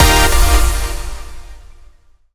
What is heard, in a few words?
big Win Loop End; netent